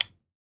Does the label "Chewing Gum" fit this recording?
No